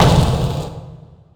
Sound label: weapon fire